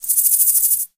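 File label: rural random insect; random amb sfx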